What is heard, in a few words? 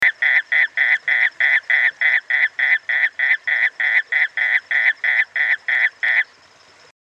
Duttaphrynus olivaceus